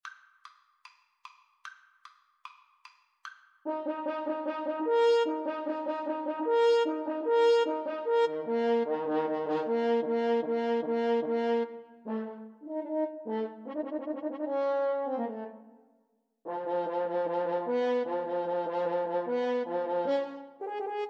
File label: French Horn Duet